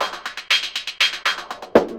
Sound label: drum loops; bb drum loops